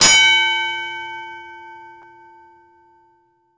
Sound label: Bell Fight